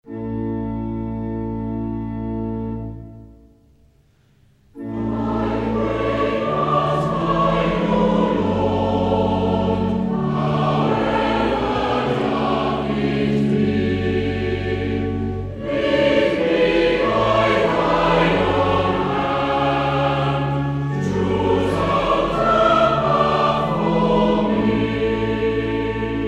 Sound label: Choir, hymns